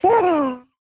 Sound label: baby girl giggle